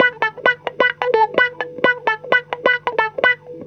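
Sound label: PIN guitar1, 130 bpm; USB Soundscan vol.32; Roots Disco; AKAI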